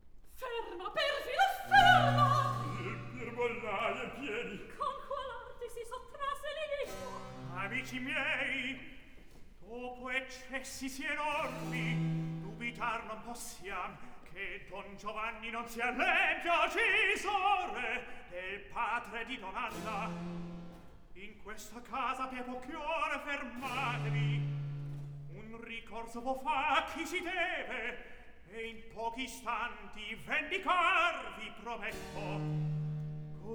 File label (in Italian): Recitativo; Frema, perfido, ferma!; 2. Akt; Mozart; Operas